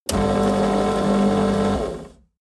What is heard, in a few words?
shredder auto